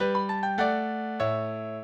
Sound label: minuet, mozart